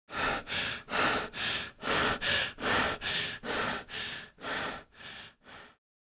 Female mature adult frightened with heavy breathing